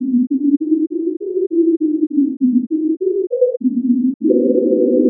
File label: narrowband noise; Pitch; Melodia